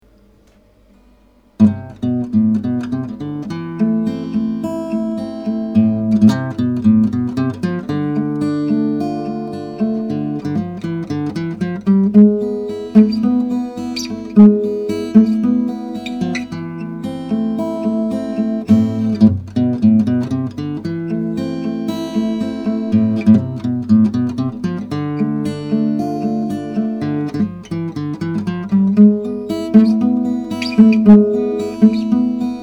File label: My guitar